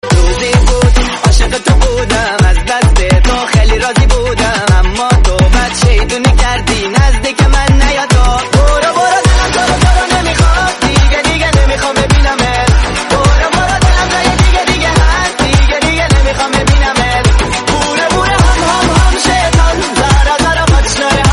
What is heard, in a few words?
Remix Song